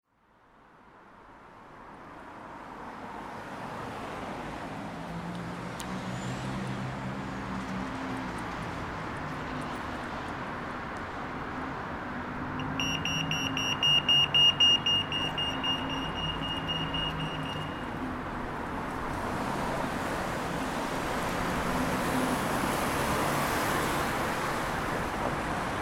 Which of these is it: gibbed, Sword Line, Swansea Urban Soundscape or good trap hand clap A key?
Swansea Urban Soundscape